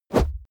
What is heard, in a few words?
swoosh close; swooshes-swishes